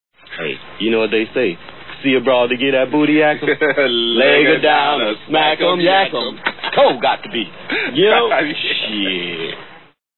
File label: airplane; jive